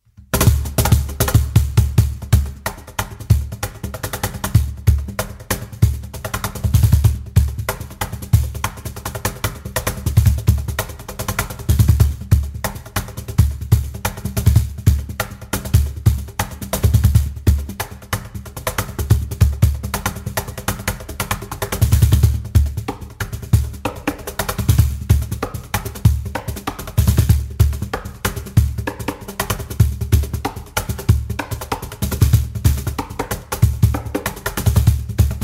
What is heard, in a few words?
cajon solo